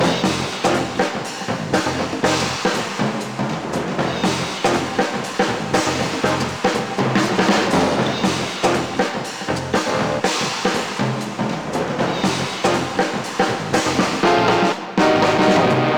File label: Boombox; lego the incredibles 2018 pc